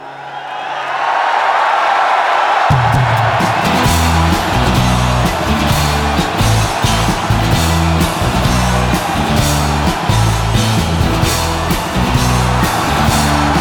Wire & Glass Version

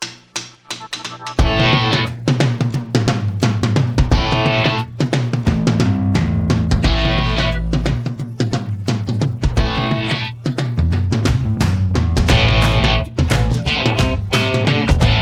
Instrumental remix

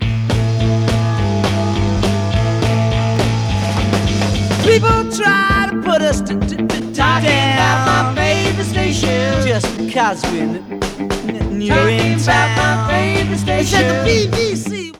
Radio 1 Jingle

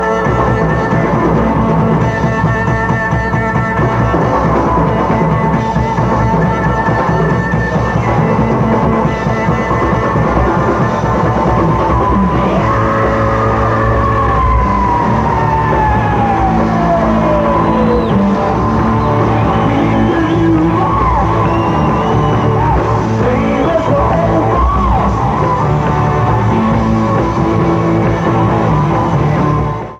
Drum Solo; Forest Hills; July 29, 1971

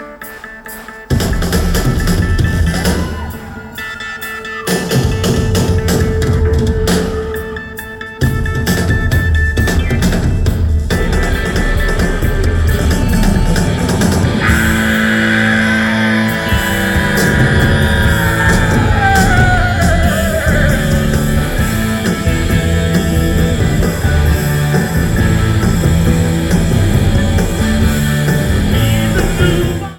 Drum Solo; Live In Portsmouth - January 27, 2002

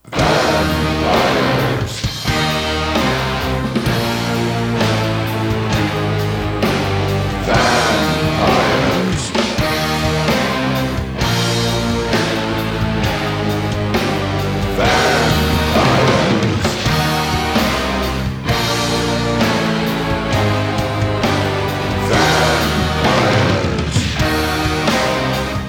Horror Rock

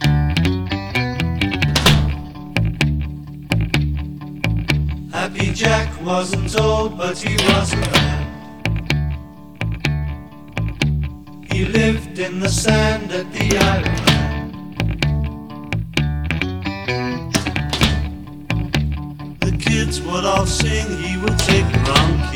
Alt Drum Version Stereo

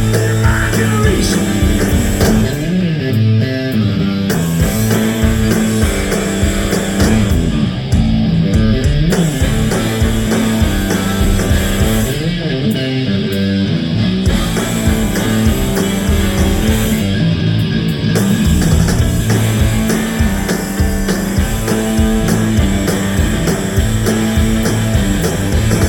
Bass Solo; Live In Portsmouth - January 27, 2002

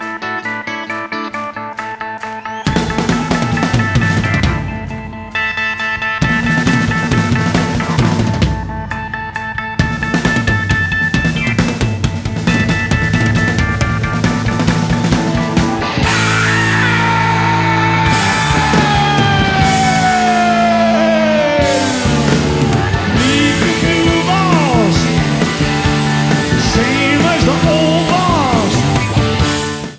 Drum Solo

